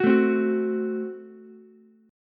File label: Gb Diminished, Ukulele, Gbdim chord; strummed; JGuitar